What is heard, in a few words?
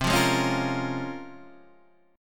Bb Minor 7th C; Bbm7/C strummed